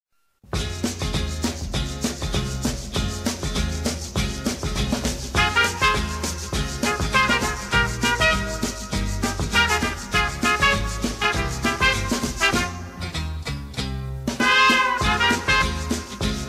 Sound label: Samba